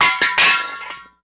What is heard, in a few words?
Hubcap On Asphalt